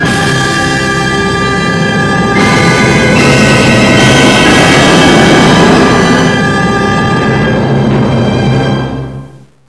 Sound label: super intense